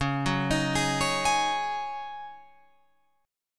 C sharp Suspended 2nd Suspended 4th, C sharp, Guitar, Open F; C#sus2sus4; arpeggiated; 13,13,15,15,16,15